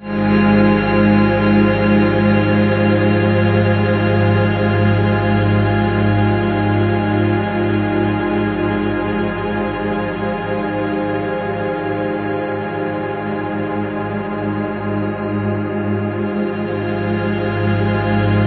BIG CHOR, Ominous Drones; USB Soundscan vol.48; AKAI

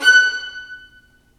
STR Violins Marc; Roland LCDP13

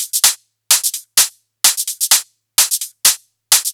Loops 128BPM, Drumloops

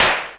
SLY CLAP; Best Service Dream Experience

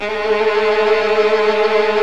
VIO MIN TRIL

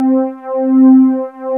ANALOG C4; East West; Global Sounds; MKS

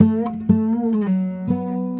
Sarangi, Acoustic String

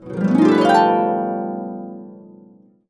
B, Harps; USB Soundscan vol.62; AKAI

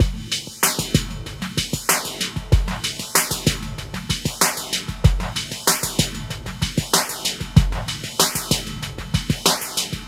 deep machine grotto groove; FRENETIC FRACTAL GROOVES

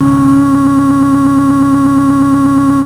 SP VOX NOIZ; SP1200; E-MU Producer Series Vol. 8 – Platinum Phatt; PlatinumPhattCD1

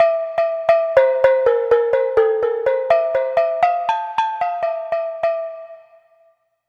Pelog Gong Melody; Melodic; Ambient-Melodics-II

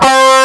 EG DIST BC3, FUNK

